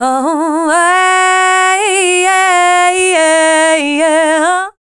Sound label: FEMALE, Dance Vocals